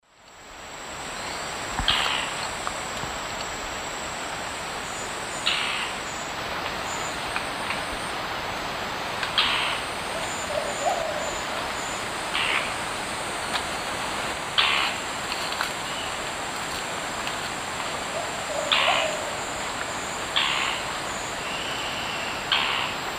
Automolus ochrolaemus, call; S. America; Eastern Lowlands

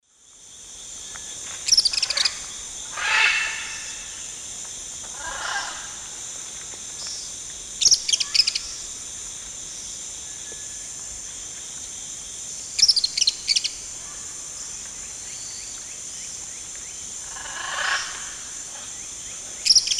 Tyrannopsis sulphurea, calls; S. America; Eastern Lowlands

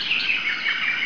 JUNGLE; 鸟; animal

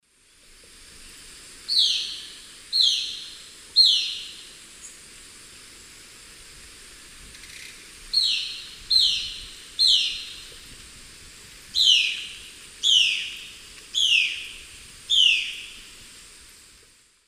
Cyanocorax violaceus, calls; S. America; Eastern Lowlands

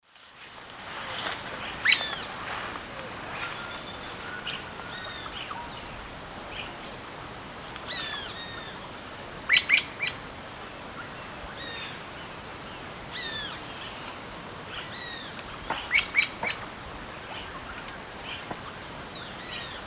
Turdus ignobilis, calls; S. America; Eastern Lowlands

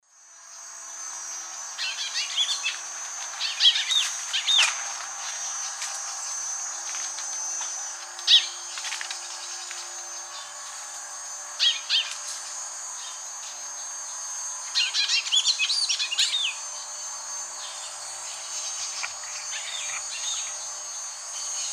Paroaria gularis, calls and song; S. America; Eastern Lowlands

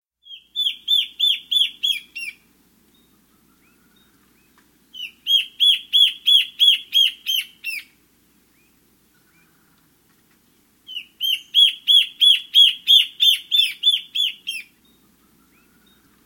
Slender Antbird; S. America

